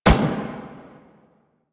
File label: Lasrian APC Turret First Shot, Weapons; Warframe